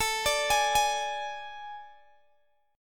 A Major 7th Suspended 4th A, Guitar, Open G

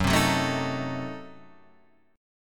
F sharp Diminished 7th F sharp Guitar Standard; strummed; JGuitar

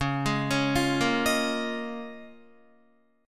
Db 6th Add 9th Db down Guitar; Db6add9; arpeggiated

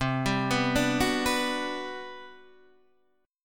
G Major 7th, C, Gmaj7/C chord; arpeggiated; JGuitar

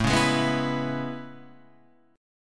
A Major 9th A

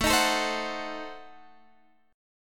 A Diminished 7th, Guitar Half Step Down

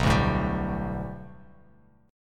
B Minor 7th Sharp 5th B Bass Standard, chord; 7,5,7,12; Bm7#5 strummed; JGuitar

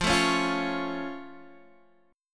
Db Augmented F, chord

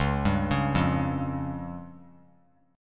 C sharp Major Flat 5th; C#Mb5 arpeggiated; JGuitar

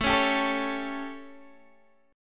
A 7th Guitar DADGAD, chord; x,12,11,12,12,11

